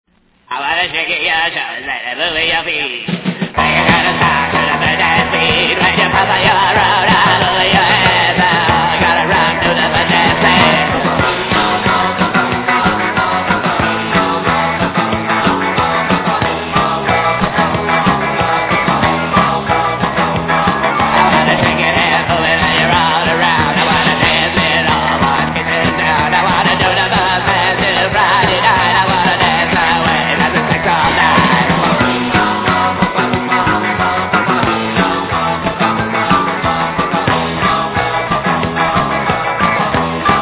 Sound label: bird dance beat